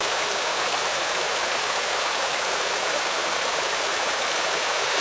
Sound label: continuous rushing mountain stream water